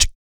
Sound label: VOC PERC, Vocal Planet Jazz FX BASS PERC